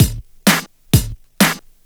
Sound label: Straight Loop 128 BPM, House